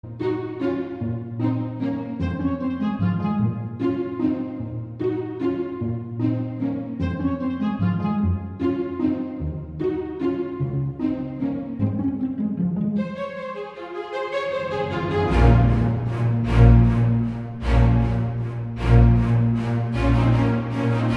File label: cool alarm